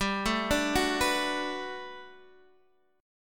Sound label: B Minor 7th G, chord; Bm7/G; arpeggiated; JGuitar